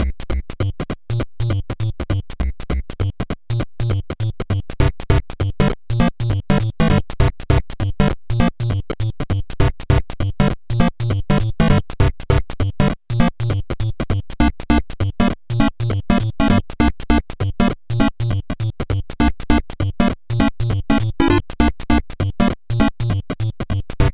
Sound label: Jungle, BGM; Super Mario Bros. Remastered